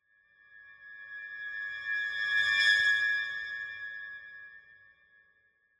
Symphonic Hit Jarring Violins Calls - Passing Tones; Hollywood Edge; sound designer's toolkit v2